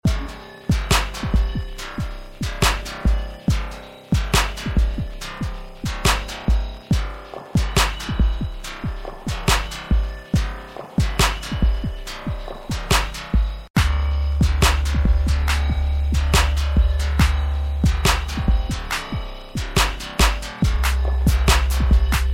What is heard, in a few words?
Concrete bass